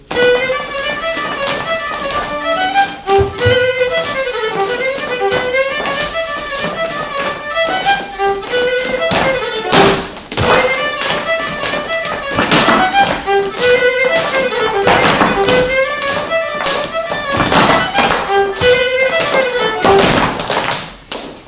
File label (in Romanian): Sarba, joc popular vasluian; de la Mitoc; Centrul Judetean pentru Conservarea si Promovarea Culturii Traditionale Vaslui